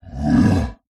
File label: Animal Mammal Carnivore Cat Lynx Growl; animals; AdobeCC